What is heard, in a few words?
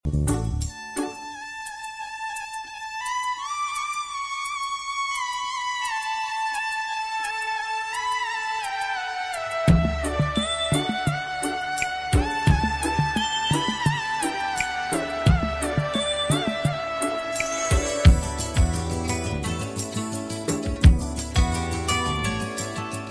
violin; ringtone